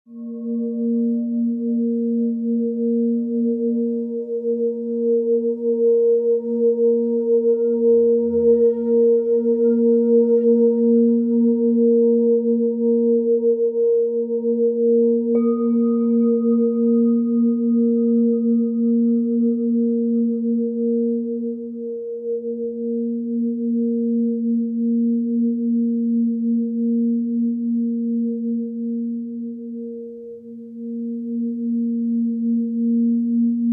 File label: Inner Light Point tone